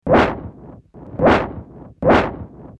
Whipping wind - Produced by harshly blowing into iphone mic while whipping the phone side to side; sound production